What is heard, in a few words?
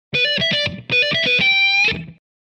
Disco Guitar Fuzzy Lead One Shot E